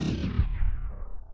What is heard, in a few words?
scroll; rapanui